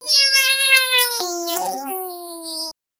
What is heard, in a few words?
pokmon sound effect that, whimsical